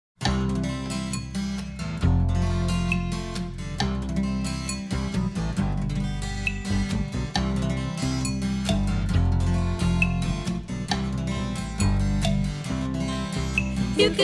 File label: The Kitchen Percussion Song